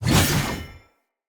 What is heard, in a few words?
Sphere Dual Sword Throw Perfect, Weapons, Swords; Player